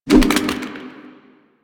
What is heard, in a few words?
Water Balloon Fire; Warframe; Water Balloons